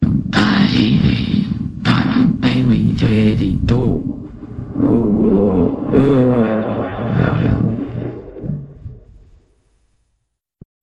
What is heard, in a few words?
jingle